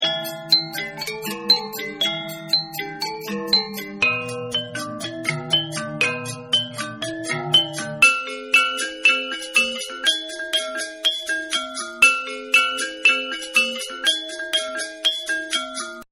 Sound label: Gamelon; World Tones